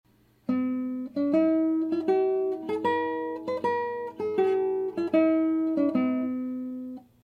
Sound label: dotted, classical guitar